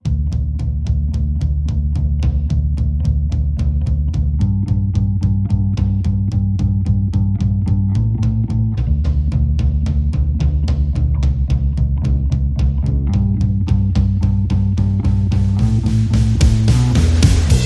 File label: Drums and Bass Mix